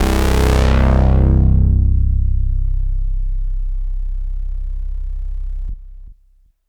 BASS, Synths; OB-6; NCHBASS

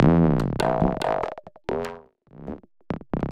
TABLAS; Zero-G; Chemical Beats

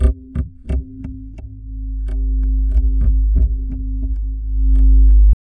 Tabla, 90 BPM; Zero-G; Pure Tabla

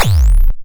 Silver BBD Kick, drum machine; 300 Drum Machines; DevSnd

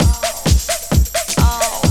Loop, Roland; Sound & Vision - Gigapack I

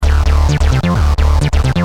Driven, Bass; Industrial

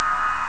space bells; Amiga Soundtracker Sample Packs

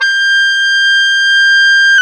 OBOE, Orchestral Winds, Oboe mf f; Roland LCDP04 Orchestral Winds; Oboe 2&3

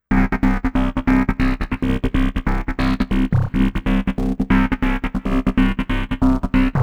Trance Bassloop 140bpm, Bass Arps; Zero-G Trance Inducer; 90 s Sample CDs

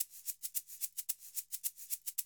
110, Classic Drum Loops

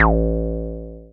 Synths BASS

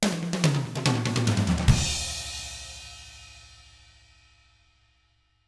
rock drums

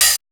Big Beat Drumloops; USB Soundscan vol.25; AKAI

